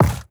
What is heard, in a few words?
common drop, inventory; ui